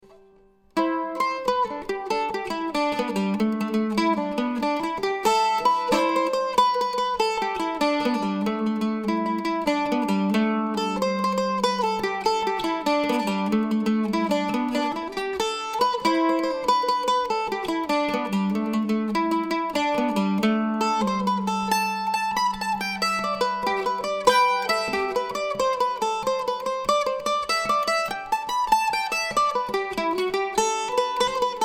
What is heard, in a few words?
mandolin